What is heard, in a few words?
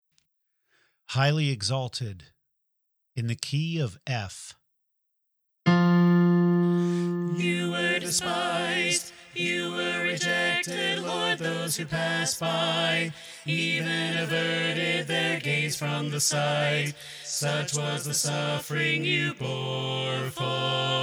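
Highly Exalted Soprano Left, choir part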